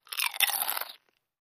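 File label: 1 Slow Bite Potato Chip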